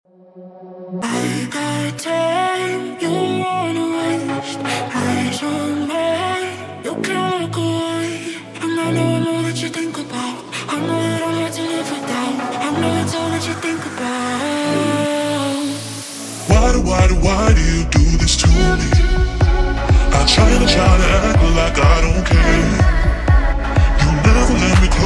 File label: Deep House; demo